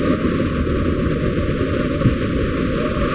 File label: tube suck, wind; video games; ambient; source engine; portal2